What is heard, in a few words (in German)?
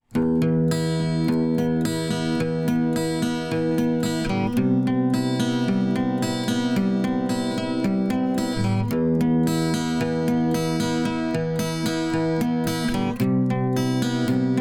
Akustikgitarre Picking